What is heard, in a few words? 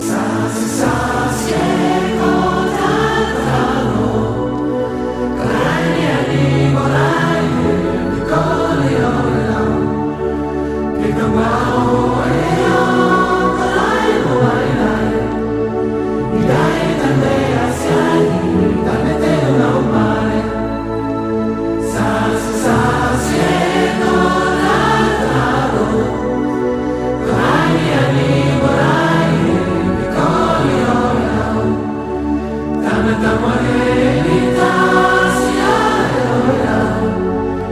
LULLABY AMBIENT MIX